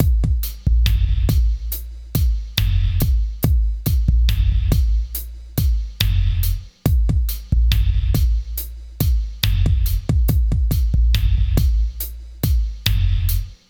hiphop 70

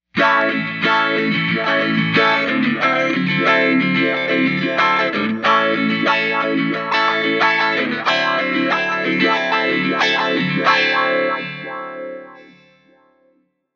Reverse Megawah

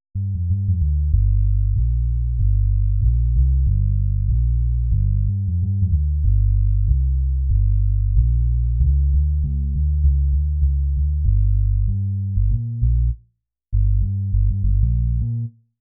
Bass

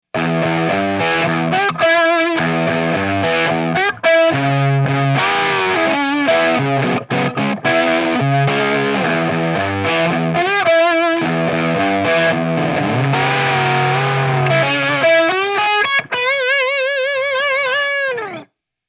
Crunch

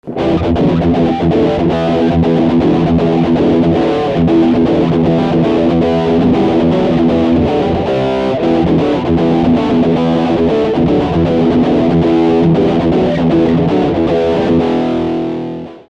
Chunk

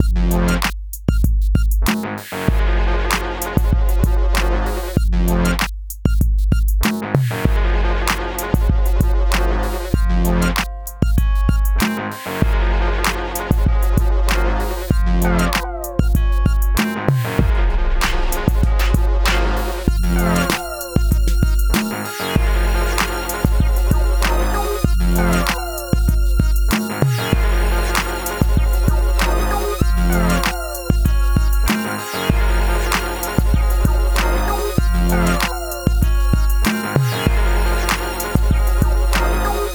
Hip-Hop; Hip-Hop Pack 01 Demo

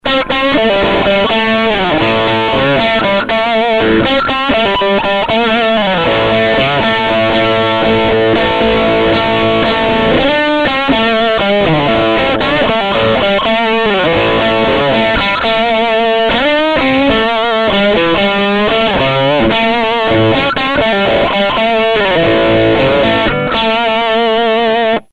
Fender Early Deluxe

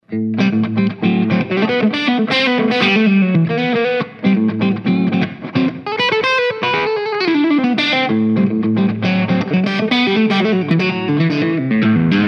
Deep Lazy

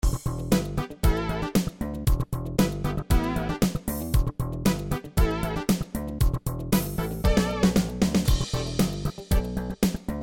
jam

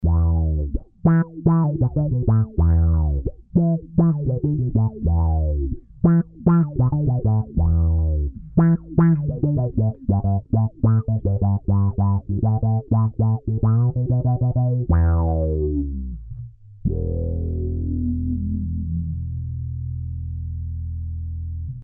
bass

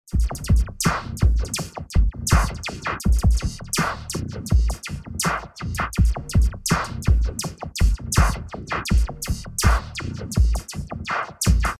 jumping bean effect

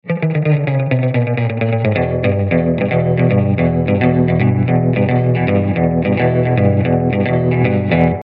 Classic Surf

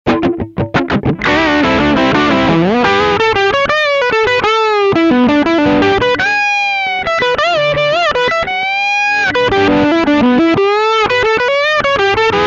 SP SLOW

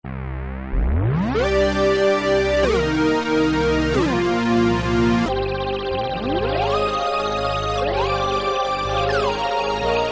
Juno 60

